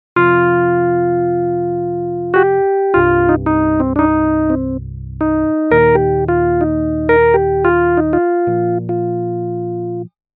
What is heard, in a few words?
Chorus melody